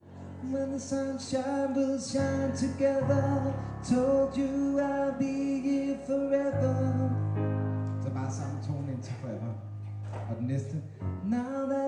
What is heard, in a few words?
tenor; kor